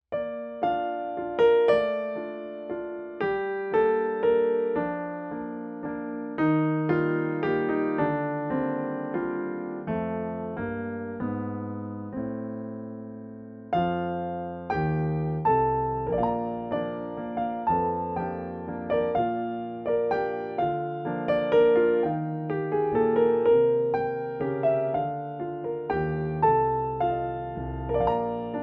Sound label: Waltz, Sunflower Slow Drag, Pianoteq